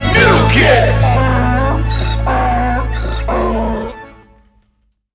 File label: Sting; Zombie Zod - Perksacola - Mulekick